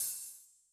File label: Open, HiHat; Yamaha DTXpress-II